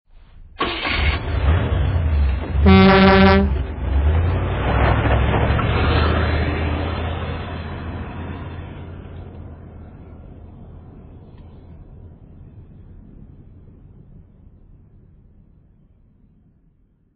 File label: Limousine horn depart; sound effects and sound reinforcement